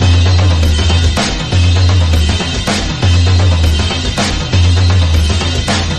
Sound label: drums; horde